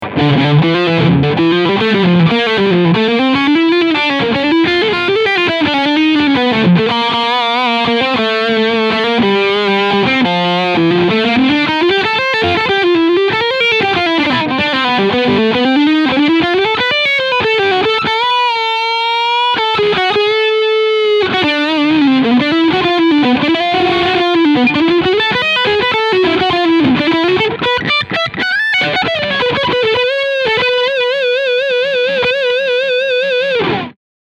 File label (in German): Gibson Les Paul Standard Lead Sound Steg Humbucker